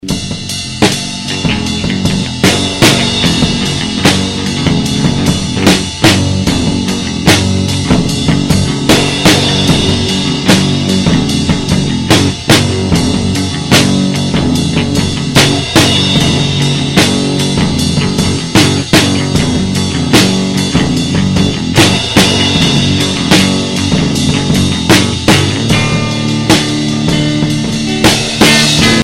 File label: instrumental